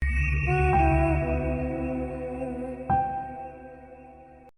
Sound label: strange spooky stinger; Horror; DailySounds